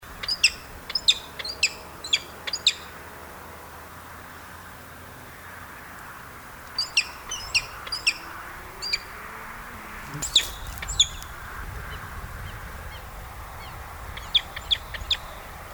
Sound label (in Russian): sapsan golos